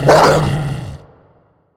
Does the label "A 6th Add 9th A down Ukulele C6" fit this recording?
No